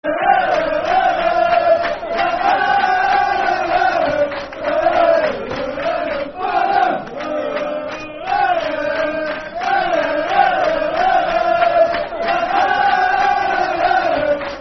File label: Fulham, Football Chant (Soccer Songs); Fulham Ffc